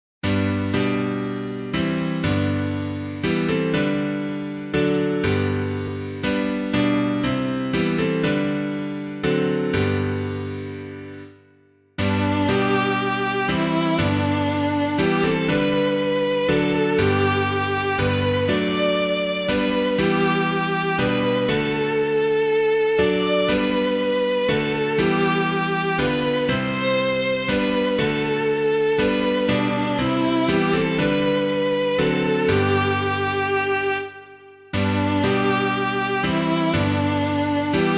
The Ark Which God Has Sanctified, MARTYRDOM, piano, hymn